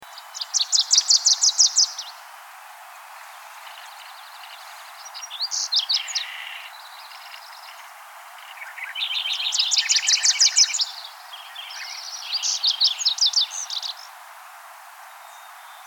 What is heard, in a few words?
Wilson's Warbler, chipe de Wilson